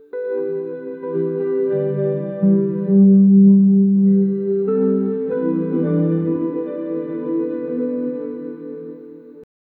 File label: warm emotional background; thankful